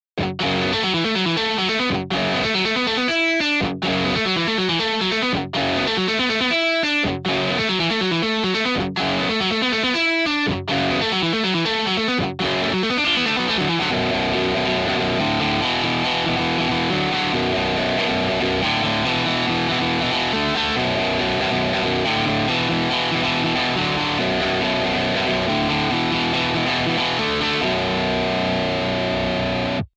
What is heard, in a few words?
stratocaster